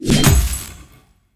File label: black shield